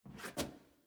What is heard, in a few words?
Footstep Wood Rotate